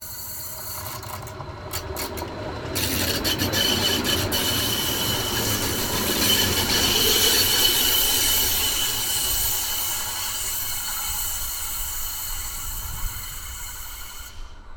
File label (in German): Klingelton; Mainzelbahn